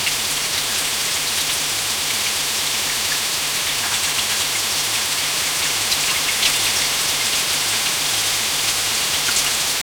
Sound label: a river of thick oil, slime